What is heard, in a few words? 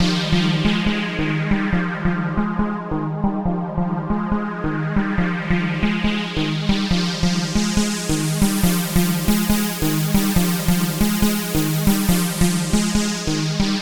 Trance Synth